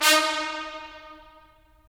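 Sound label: brass trumpets staccato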